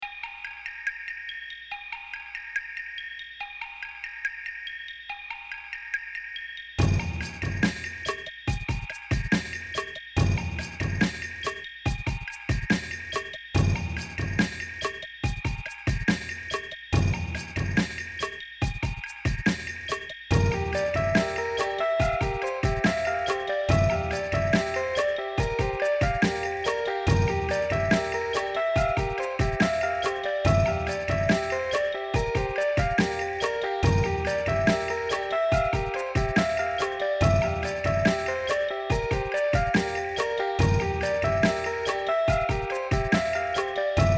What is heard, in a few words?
crunchy claves bells